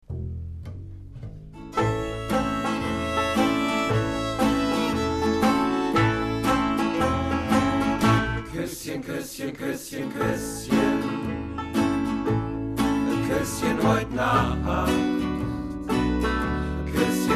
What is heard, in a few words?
Country